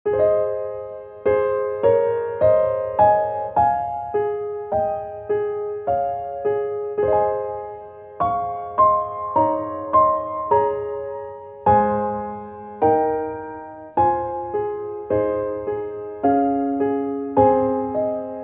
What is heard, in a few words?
piano; gluck